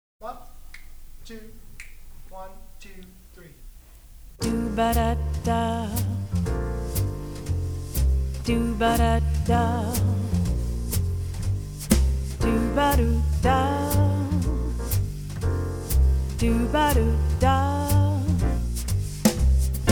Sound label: Bb Call And Response